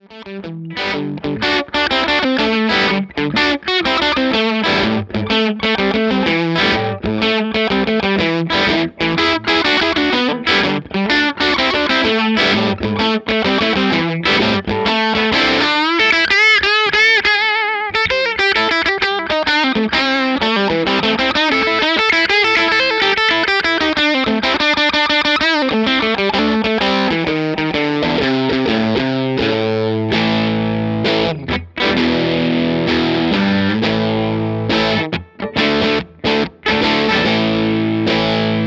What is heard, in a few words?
Humbucker Bridge, TC-15; Ch1 Hi Munch Hi PRS Mira